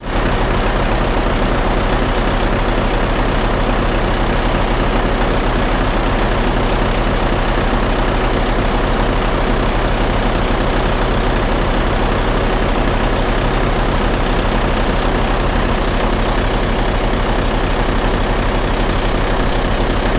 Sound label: dump truck idling